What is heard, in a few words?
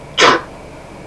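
sneezing; People; Sound Library 2000; Wayzata Technology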